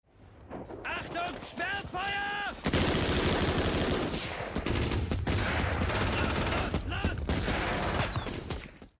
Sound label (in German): sperrfeuer